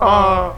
uh male duo; ooh ahh; uh um

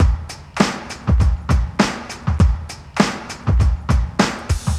steady eddie, Drum loops Boombap

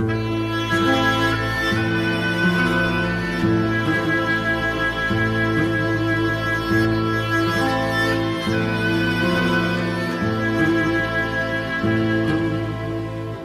strings, Sad Loops

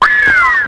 whistle, Stabs

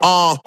"ah", Voice

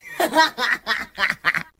laugh nongender; ooh ahh

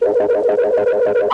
inserted; Zaps and Beeps

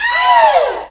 "oh" group shock; ooh ahh

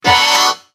robotic, Voice; Notes

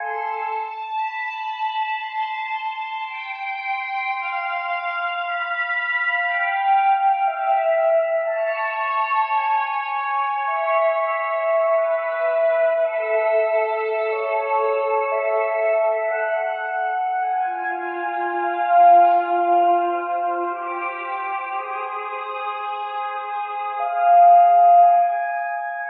strings, sad

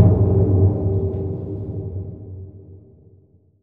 boomer; Crash Bang Boom

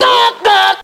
dah dah; ooh ahh

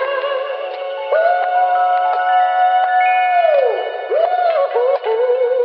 voice ai woo; Loops; Phonky

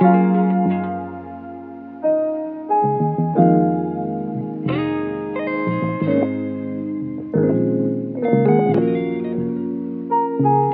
piano and guitar, Loops; Phonky

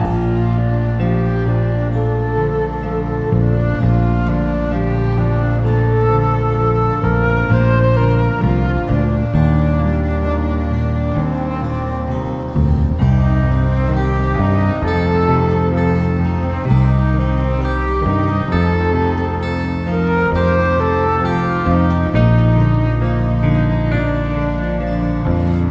flute

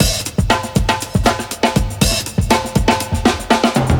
dusty groove, funky drum loops

